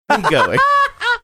funny laugh

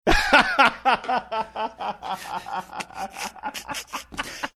laughing snickering

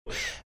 wheezing

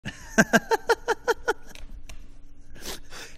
cute laugh

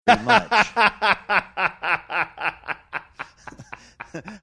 having good laugh

